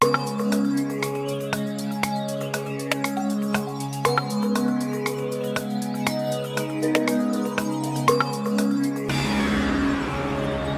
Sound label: Choice Music; Wheel of Fortune